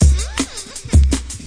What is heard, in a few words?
162 squeal beat; drum loops and breaks; 161 to 180 bpm